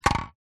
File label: vehicles bicycle helmet plastic set down drop ground